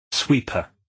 sweeper

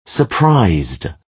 surprised

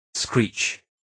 screech

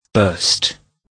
burst

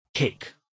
kick

